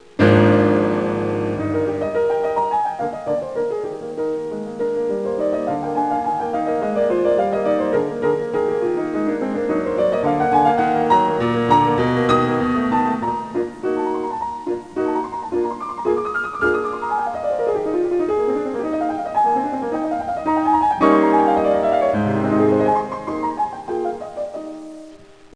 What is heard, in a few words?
SONATA